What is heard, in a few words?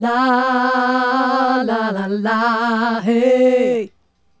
Female Group, Hip-Hop and RnB Vocals